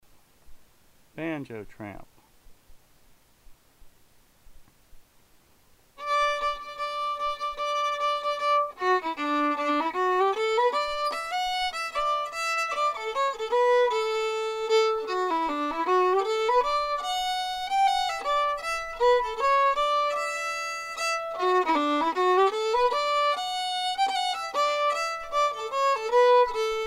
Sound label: fiddle; Banjo Tramp; festivaltunes; fiddle set 1